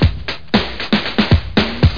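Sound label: drum beat, instruments musical; World of Sound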